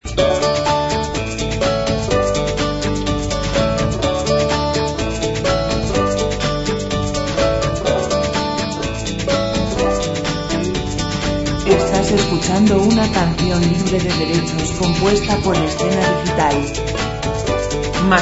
aldenias 125 pop

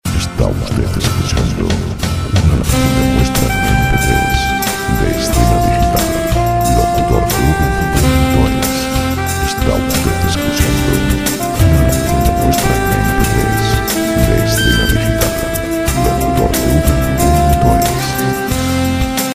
91 folk

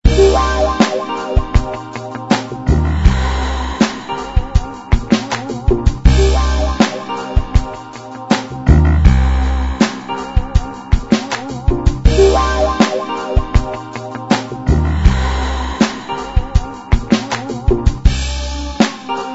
royalty free music

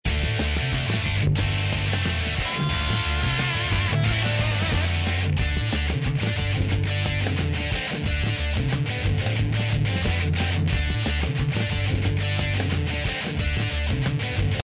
rock royalty free music

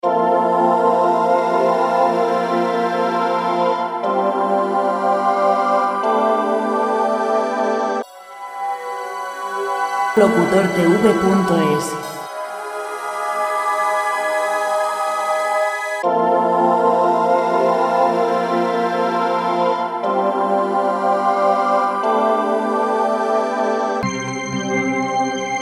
120 classic; clasica

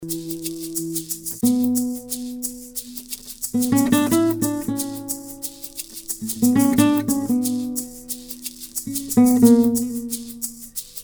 90 guitar esp music, folk musica libre

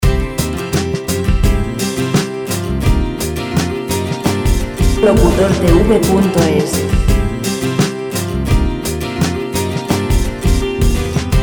85 C pop; Música pop sin copyright

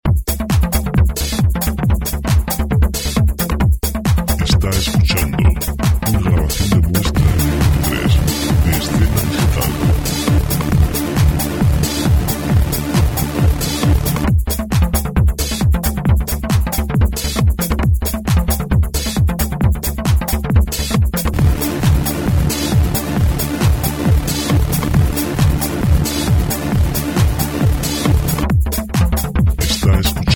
120 disco; spots; Background free dance music

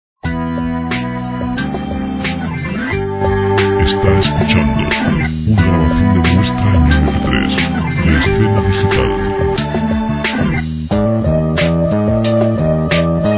90 E rock

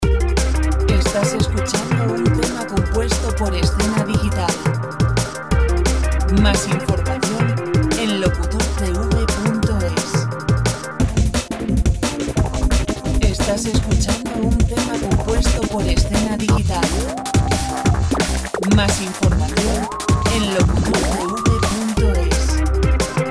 175 dance; Royalty-free music

